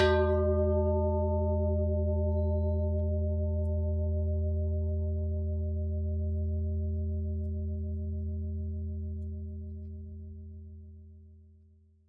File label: gong